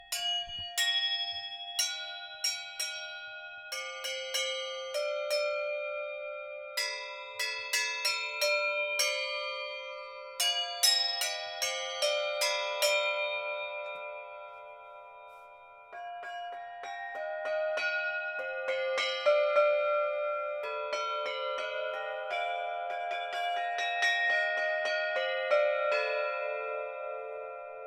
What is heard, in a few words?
blossom bells; pete engelhart